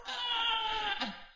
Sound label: Shitting small shit